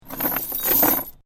Heavy Chain Drop; LYN104 package of Chain sounds; Metal Sound Effects; LynneMusic

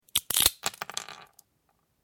Nutcracking, Cracking a nut with a nutcracker; Nicely defined, dry crunching sound; Cracking Sound Effects

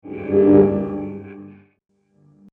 Laser Beam Sword, light saber sound effects; science fiction sound effect

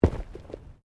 Body movement Impact Roll

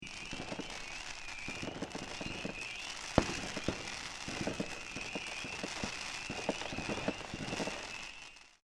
Fireworks Exploding In Distance; Fireworks Exploding And Burning In Distance; Stuart Duffield

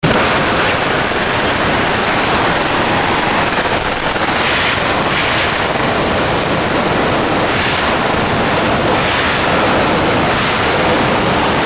static bed; Static Bed Harsh Filter Fluctuation; Production Element Imaging Element Accent Transition; Blastwave

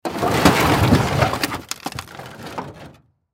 Crash Debris Metal Slide Junk; Sound Effects:Materials; Blastwave

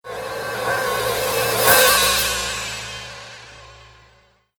Reverse Cymbal Whoosh

